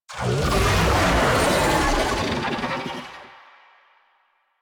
Infested Oni Vocal Idle; Warframe

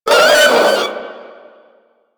Proto Infested vocal short attack

